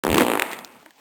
pain

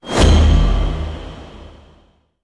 UI Pause Open

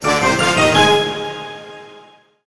Exiting Vehicle; The Simpsons Hit & Run; ps2; Saving Springfield

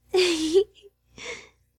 Cuddle; Bedroom; Hilzartov; Fairy Size Doesn't Matter

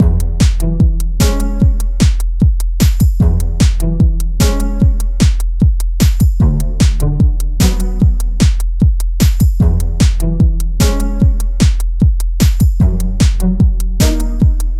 Drums (Layer); Wii Play Motion; Wind Runner

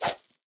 Chain Cut; Cut the Rope Time Travel

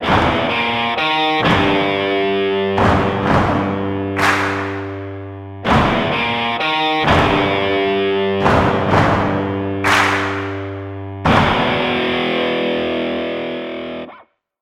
Victory (Lose); Thunderhead; Speed Racer The Videogame; PS2; Wii

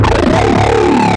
hard robot boss; gonner linux macos ps4 switch windows xbox one gamerip 2016

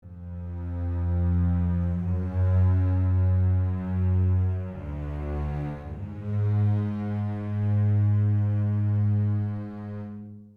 Tense (Loop)

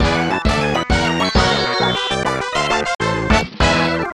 Win Jingle; game rip; lol never party alone; nds

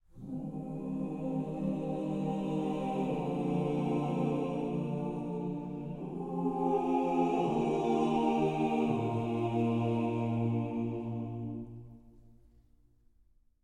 Choir Sad Long Slow; The Dwarves 2016 ps4 gamerip

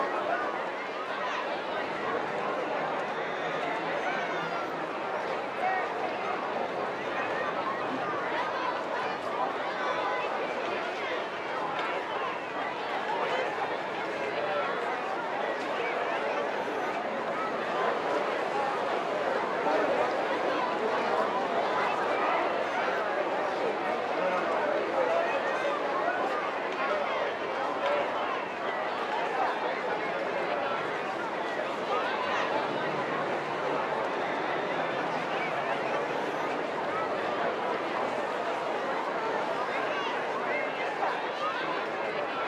Ambience - crowd large; gamerip; sim city societies

